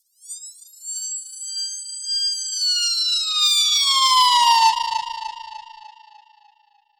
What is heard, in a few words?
Filter Proc, alarm siren, 100bpm; musicradar